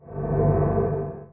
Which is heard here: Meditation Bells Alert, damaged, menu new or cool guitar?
menu new